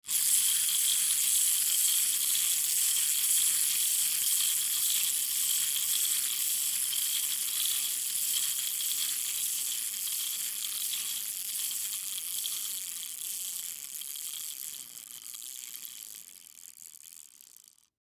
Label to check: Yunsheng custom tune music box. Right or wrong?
Wrong